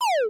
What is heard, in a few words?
fire; no longer alone sfx